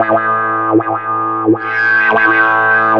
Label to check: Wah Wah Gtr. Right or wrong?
Right